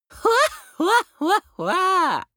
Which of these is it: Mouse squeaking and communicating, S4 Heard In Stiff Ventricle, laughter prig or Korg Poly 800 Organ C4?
laughter prig